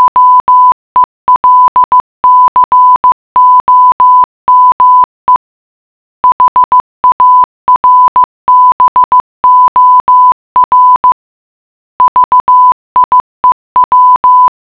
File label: morse